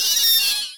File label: screams alien